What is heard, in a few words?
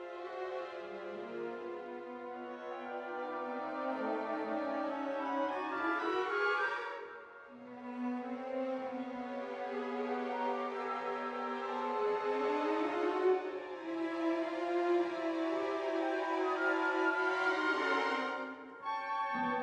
Orchestra Concerto Quietly Lyrical